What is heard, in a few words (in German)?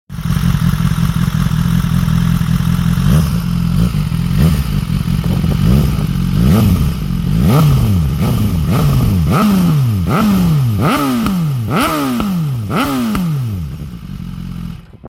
Soundfile S1000R, Auspuffsystem; BMW; 2017-2020; BODIS GPC-RSII Full-Titan 0,98 kg